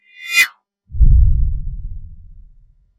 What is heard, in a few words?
short tense sting